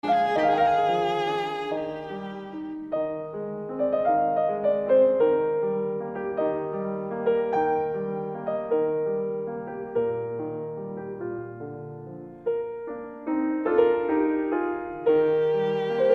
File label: Violin Sonata No. 26 in B-Flat Major II. Andantino Sostenuto e Cantabile